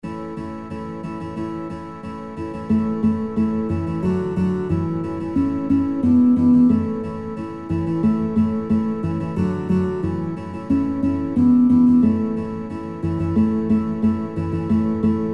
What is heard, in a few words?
Guitar, kinderlieder gitarre playalongs; Guitar Songbook; Old McDonald Had A Farm